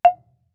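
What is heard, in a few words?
Marimba deadstroke fortissimo F5; MIS Pitches - 2014; Percussion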